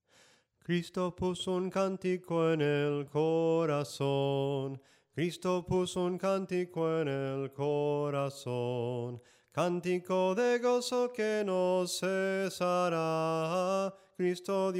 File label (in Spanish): SOPRANO CANTADO, himnos; CRISTO PUSO UN CANTICO